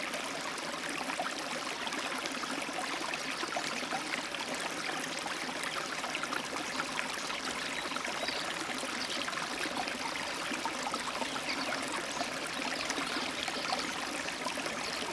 Brookside Birds, Morning Songbirds; Echoes of Nature

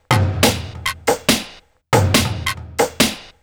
Snare and Perc Loop; Dance

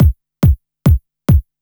Kik Loop, Dance, Beats

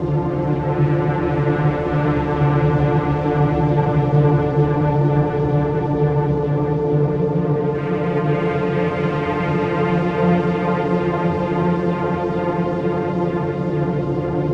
Pad; Dance; Pads

